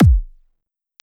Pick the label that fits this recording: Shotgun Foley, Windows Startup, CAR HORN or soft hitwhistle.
soft hitwhistle